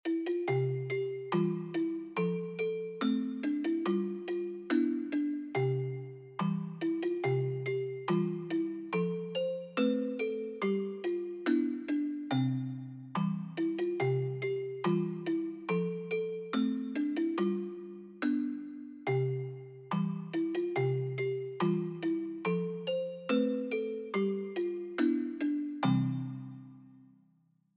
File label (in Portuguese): Caranguejo Nao E Peixe Melodia